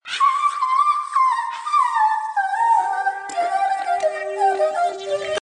Chorus

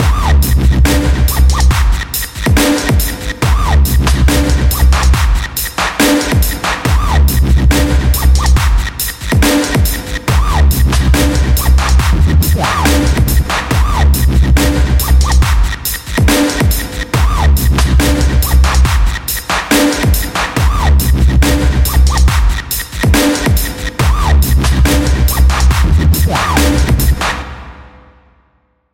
Dup Step Track

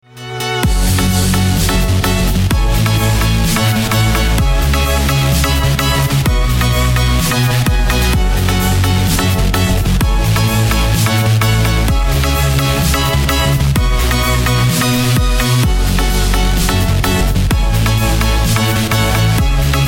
trancestep

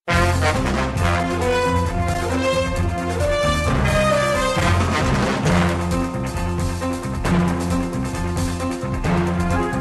chilling tone

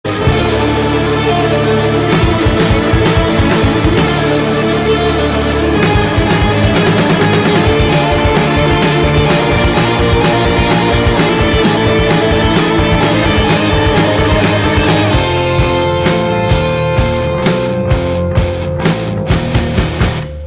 solo

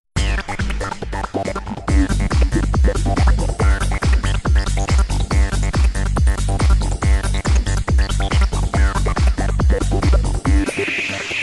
dance fast

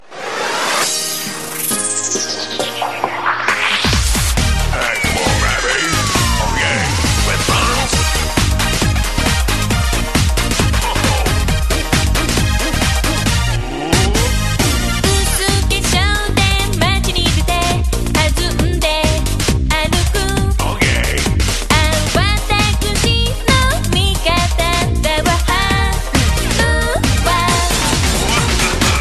Cute Intro

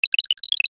switch icons

